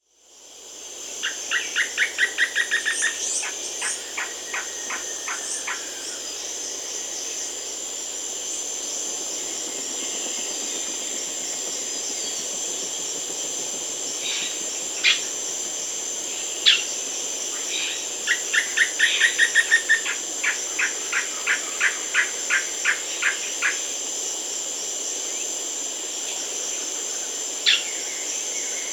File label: Crested Foliage-Gleaner (Automolus dorsalis), Peru; S. America